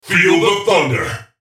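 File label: spellcast effort; Jayce Sentinel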